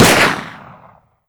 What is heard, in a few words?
beretta shot, weapons